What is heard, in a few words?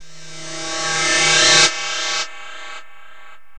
SFX, Drum & Bass 170 BPM; Ueberschall; Drum & Bass Flash Trackz